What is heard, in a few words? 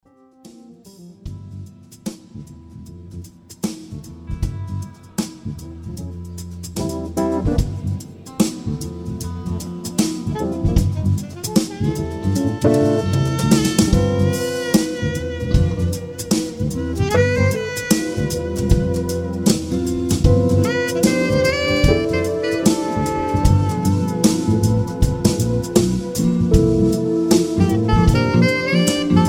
Quintet Cocktail Jazz Live Event